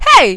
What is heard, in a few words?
pain